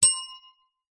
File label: bell small reception desk bell single ring